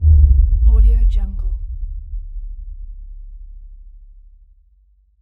bass drop

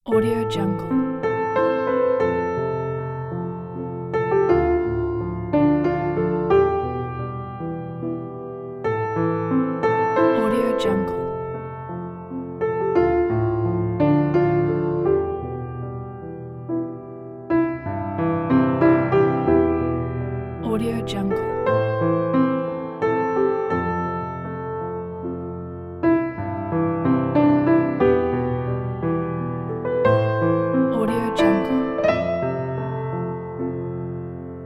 soft emotional piano background

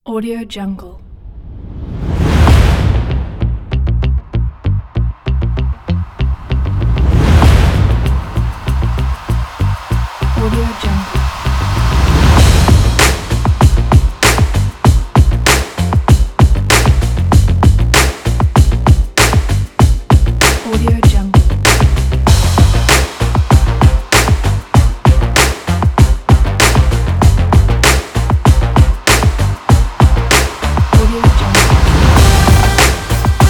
sport driving car advert; audiostory

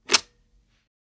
cell phone fliptype open

